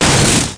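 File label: car to car; ARCADE; SRACER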